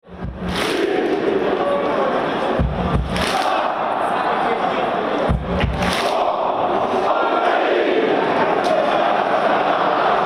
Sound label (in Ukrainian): match zbirnoyi ukrayiny na donbas arena